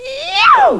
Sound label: eeooo